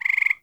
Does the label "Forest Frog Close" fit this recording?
Yes